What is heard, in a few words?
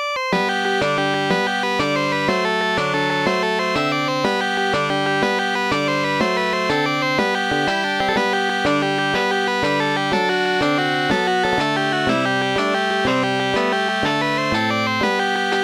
irish washer woman; Instant Music